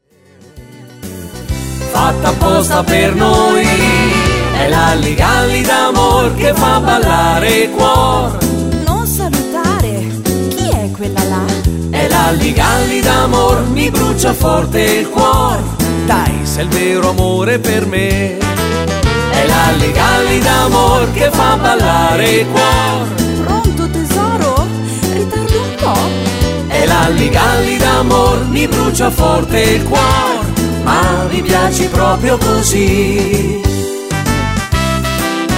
hully gully

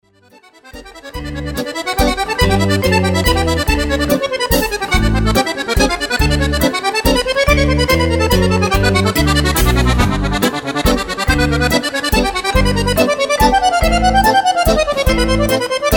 mazurca variata

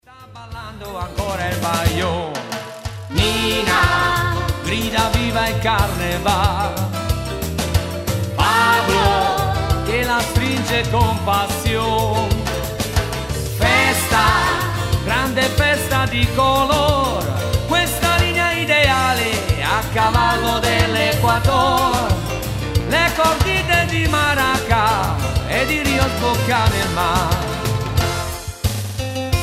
maraca

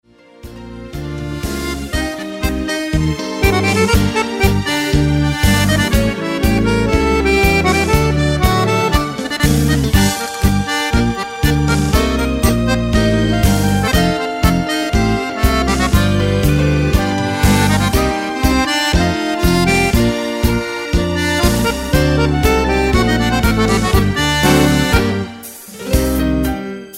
tango

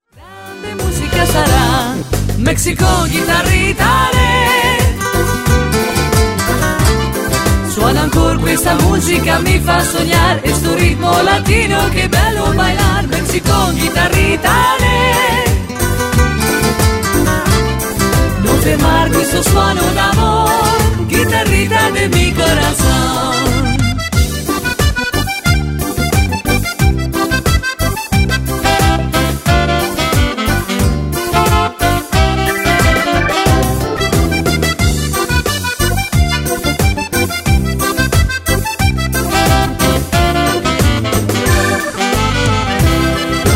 guitarrita

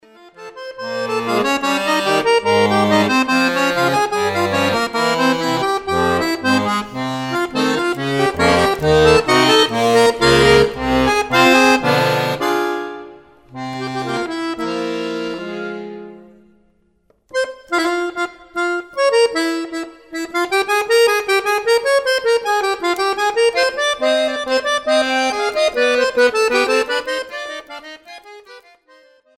preludio e fuga in fa min; Bwv 881; bach

